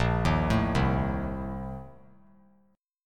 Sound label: Bb Major 7th Suspended 2nd Suspended 4th; BbM7sus2sus4 arpeggiated; JGuitar